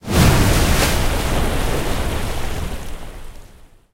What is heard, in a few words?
tanks explosion water; War Thunder